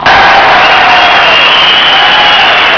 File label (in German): MENGE